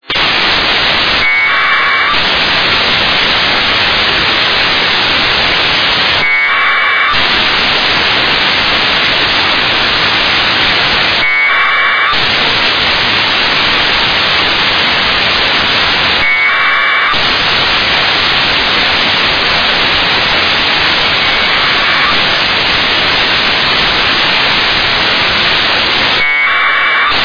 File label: 1k2; O/OREOS; received the 1k2 AFSK downlink signal on November 20th 2010 at 04:20 UTC